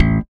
bass 3 (A) pluck; Sonic Foundry (Sony Creative Software); Ugly Remnants VOL-2; Basstuff

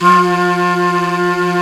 Flute, Singin' Flute

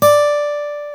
GTR, Dual Guitar; Club-50 - Foundations; Paul

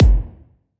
kick verb

